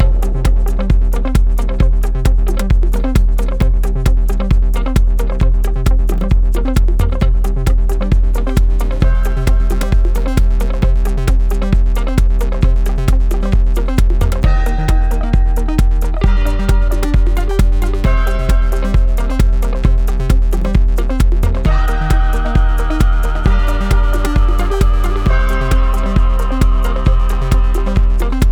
Panic Loop; pang adventures

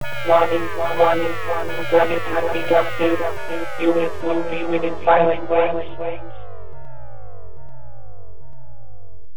Warning

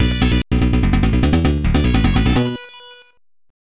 Bonus; Aleste 2; MSX2

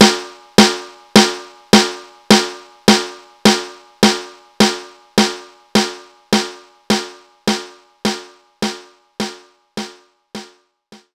Standard Snare

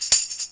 swing; Golf Blitz 1.12

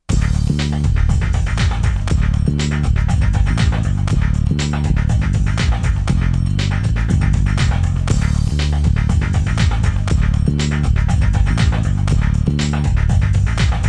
Bass; Backyard Hockey

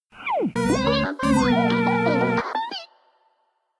Swipe Failed; papa pear saga android ios mobile windows gamerip 2013

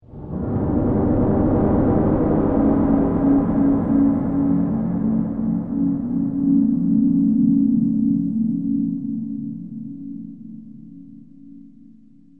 music sfx space trip; AquaNox 2: Revelation

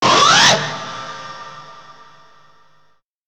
Scare

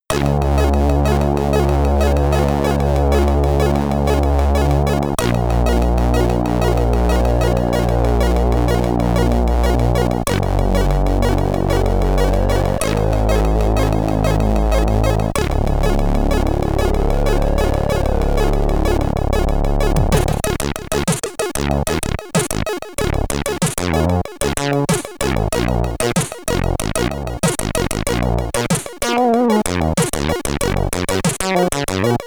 Title Screen (SID Stereo); Roll Over; Commodore 64

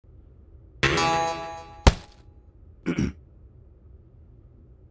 Spring; Fleeing The Comples

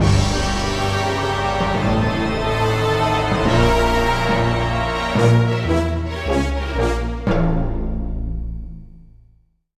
Lose; Grim Adventures of Billy & Mandy; Wii

